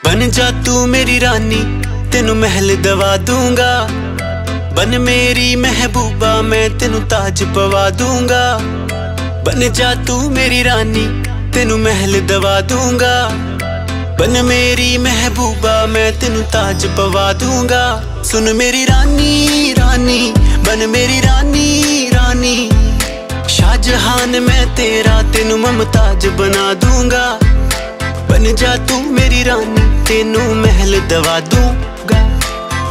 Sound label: Punjabi Song